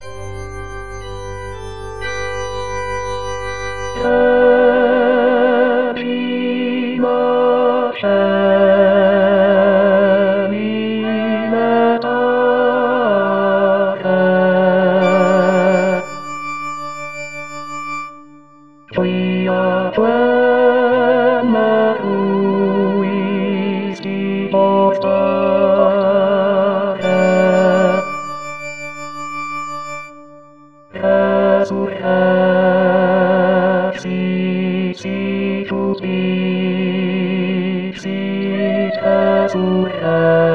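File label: Easter Hymn Latin Baritone; Mascagni Easter Hymn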